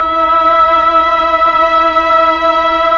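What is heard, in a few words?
Pad Tron Violin & Choir E5, Slow Str+Vox; Keyboards of The 60's and 70's; Melo. Str+Vox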